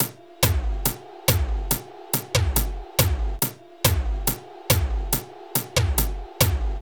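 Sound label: drum loops, Left; Big Fish Audio; Prosonus 500 Drum Loops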